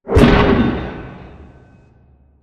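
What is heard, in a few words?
Assembly Conveyor Stop Distant; Boomtown; Titanfall 2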